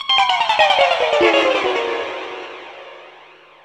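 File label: guitar picking; AMG; Now CD-ROM (Roland)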